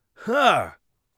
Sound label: satisfied, warrior voice; Warrior Voice Pack